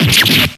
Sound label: hurt aa